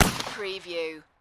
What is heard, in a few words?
Shot Gun Mossburg 500 12 Gauge Single Shot Exterior At 45ft; Gun sound effects; gun shots; Gun sound effects, bullets, gun shots, pistol sounds, rifles, machine gun sounds